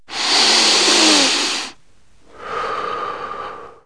Male Deep Breath; Wild and Wacky Sound Software; 1,000 Sounds; Human; Breathing & Coughing

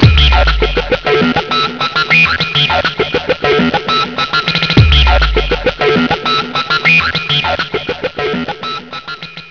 BEAT

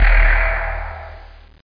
DAMAGE; MAGIC; DuelSounds

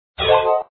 reward; super racer

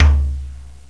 Jazz Tom; Drums; TG300B; GMPlay

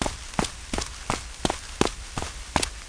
footsteps

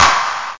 clap; algomusic; rhythm

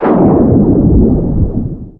submerge; forsaken

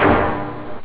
hit, stabs

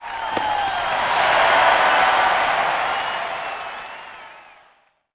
cricket; buildings

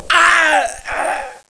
SCREAM

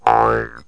Large Jews Harp Bullfrog; Music; Comedy Accents

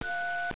dial; 12000 Graphics Pack - A Complete Graphics Collection For Your PC

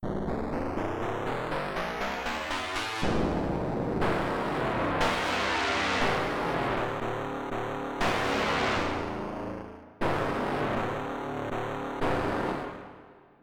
Bantu Guitar Pad

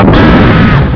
Player Hit + Scream; Missions of the Reliant!; Arcade

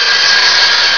SCRAPE; Hellbend

